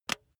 razor attachment retract

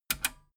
metal button radio click disable fast heavy

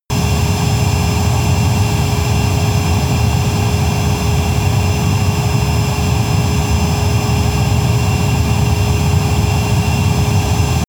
military jet fighter turbine steady tonal

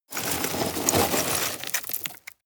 scrape rocks hard

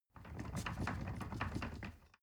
ladder wood rattling long

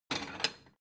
plate small take slide hard

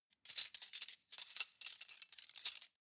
cutlery teaspoons handle long

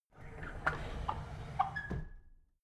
drawer big squeak close soft

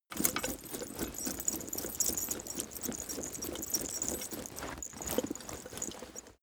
leather metal movement soft fast sequence

